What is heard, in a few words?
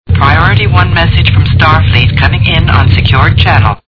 New Message, Notifications; Star Trek; SMS